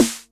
909 HI-SNR, TR-909 DRUMS

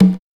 CONGA

